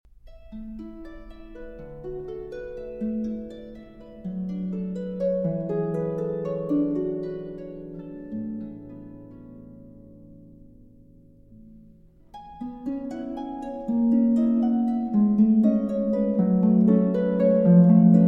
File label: Preludes For Harp Alone; Salzedo; No. 2, Quietude